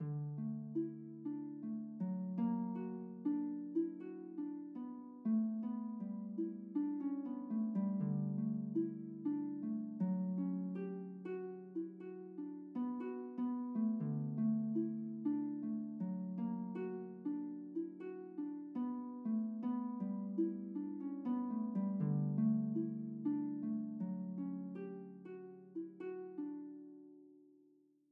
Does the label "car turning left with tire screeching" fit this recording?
No